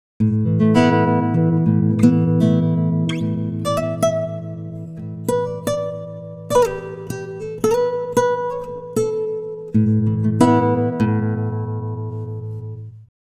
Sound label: timestretch guitar; ikm sample tank ps ts